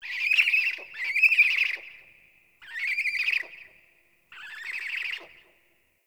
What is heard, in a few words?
Night Tree Critters